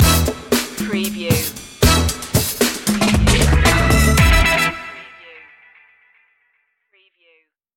shake n break stinger

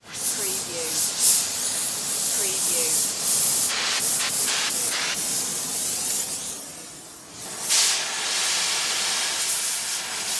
Blow Torch Operate; sound-effects-bible

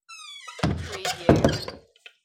Wooden Door Close With Door Creak; Sounds of doors and windows opening and closing, keys locking / unlocking doors

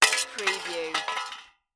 Metal Junk Small Onto Dirt Ground

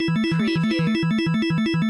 Casino Slot Machine Organ Loop

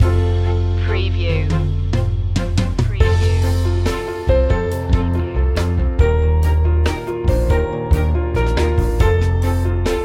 acoustic mood

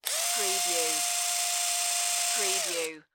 Cordless Drill Tight

